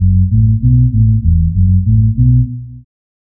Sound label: bass slowed reverb